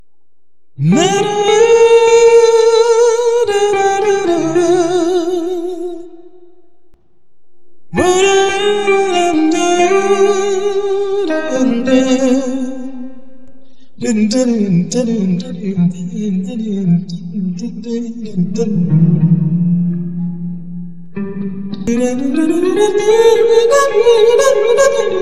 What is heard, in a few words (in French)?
guitare voix intro, La guitare doublée par la voix de l'intro